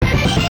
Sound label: jingles HIT; Kenney's Sound Pack; Music Jingles